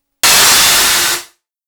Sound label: valve blow; castle class